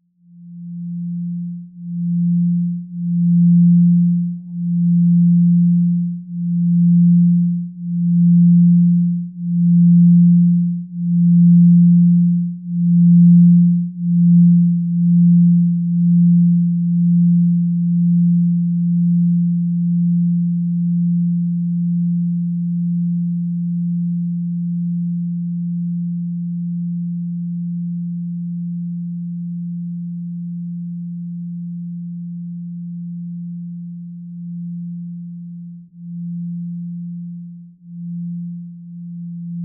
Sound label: 33 Bowls Set Fundamental